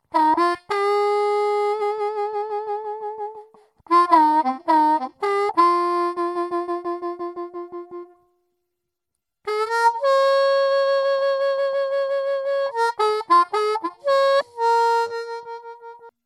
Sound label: Turboharp Bb